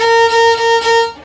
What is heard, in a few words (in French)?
violon